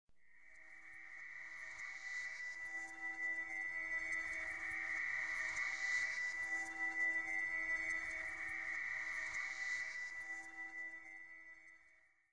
stinger; Myst III Exile

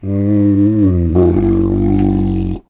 Herb growl, Dino

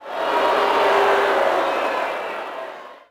Boo, Crowd, reactions

